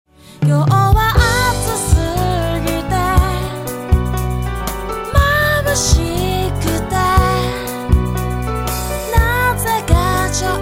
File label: music